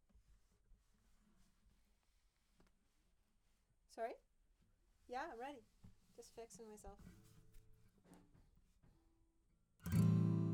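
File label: Acoustic Guitar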